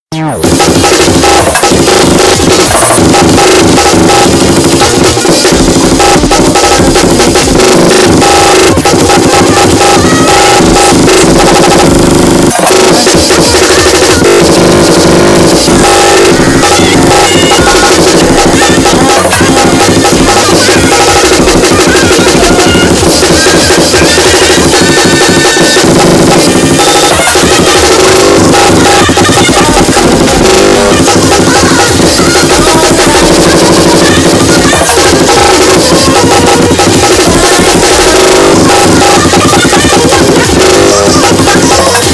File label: trap of amen, lolicore